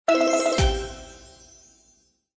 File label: win X; Paradise Escape